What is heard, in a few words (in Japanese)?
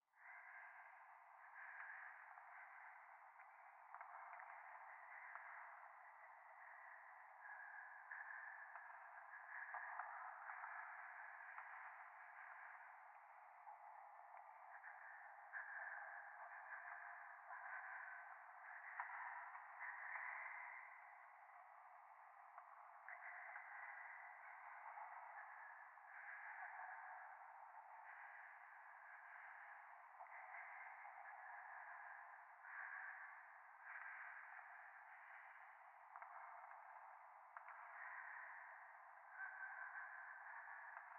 ゴースト 右耳快感吐息 電車